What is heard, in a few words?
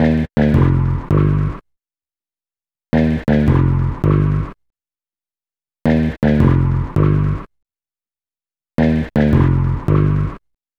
Bass, Hip-Hop